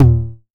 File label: TOMS 909; Electronic; Wizoo - Platinum 24 Electronic